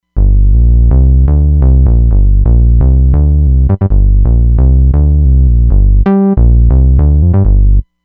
MC-404 Bass; Roland Fantom XR

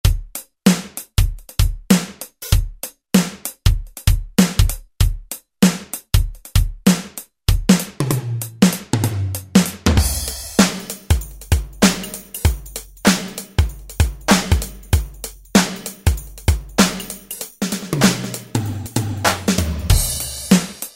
Funky, Alesis SR-16, User Patterns

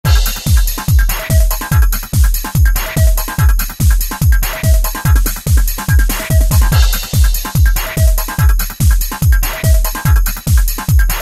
Distorted Slice, Factory Patterns; Korg ES-1

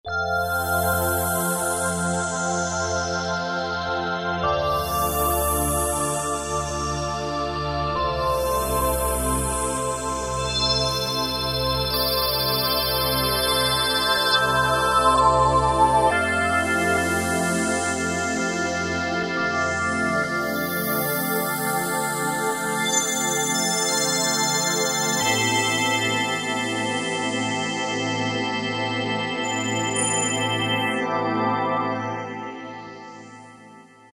Rhy Of Lfe, E-mu Morpheus; Factory Presets

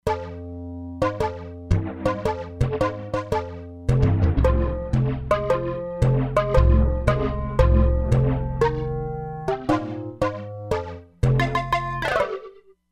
Whambambu, E-mu Proteus-3; Factory patches

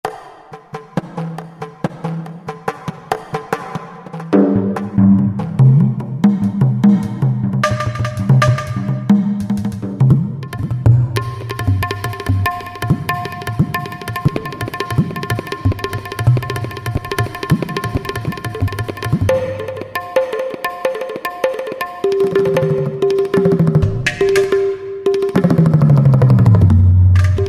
INDIAN PERCUSSION; Spectrasonics Heart Of Asia

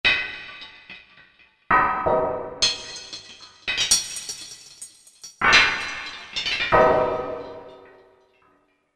Smash Glass, Korg MSC-14S Sound Effects

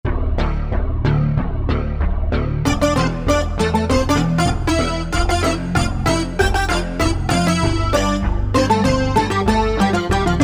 Bass Talk, Korg MSC-2S Synth; Synth 1

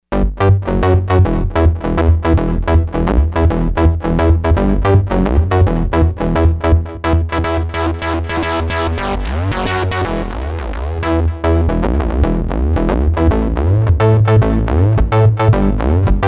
X-Bass, Novation XioSynth 25

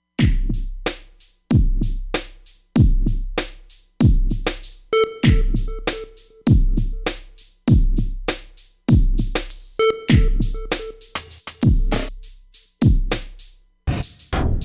Organic Kit, Roland Fantom XR; Drums

